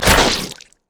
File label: passive death; LoL; zac base